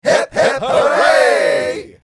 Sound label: Hip hip hooray crowd celebration